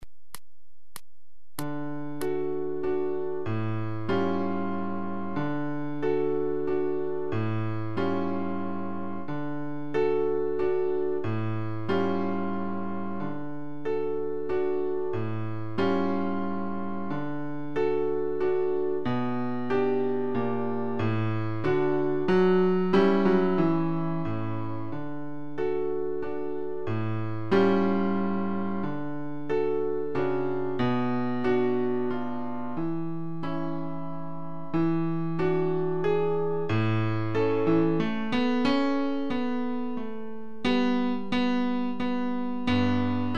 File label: Waltz 96; Seiber; Rumba Bis Walzer